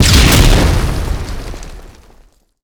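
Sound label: grenade explode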